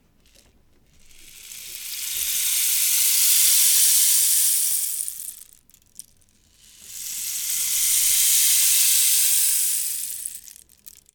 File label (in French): Bâton de pluie